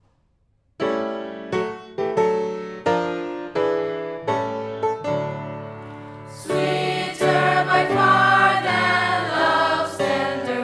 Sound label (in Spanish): Himno Coro Secundaria y piano